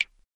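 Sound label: Drip Small Sounds Light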